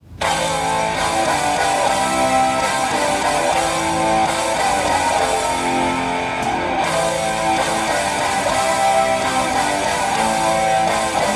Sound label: Laptop Music; sons of the forest